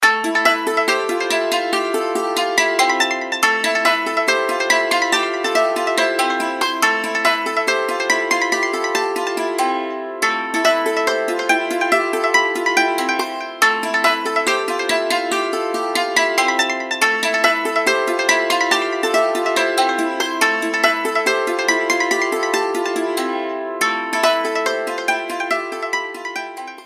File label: Htc Velvet, Ringtone